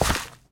gravel, steps; mc gravel; the monster plane; kolmods

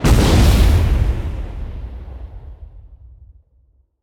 explosion; poppy; catnap dragon; godzillark

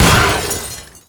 throwable smash; weapons; breadmonster

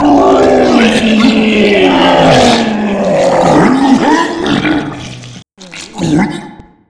vomit; Garry's Mod; tf2

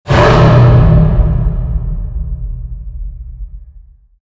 furnace shot, ambient, harbor; Garry's Mod; tf2; cp harbor

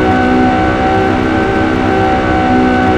mid, vehicles; tralalelo ferrari; sim fphys fer laferrari; salon

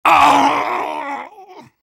soldier pain severe; vo

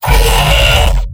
pyro m pain sharp; tf2; garrysmod; mvm

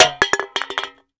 item soda can drop

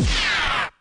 dash

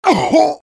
pain sharp; heavy; Garry's Mod; tf2 content

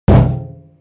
Foot; drumseratops

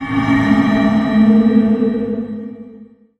silence; the monster plane; kolmods skill

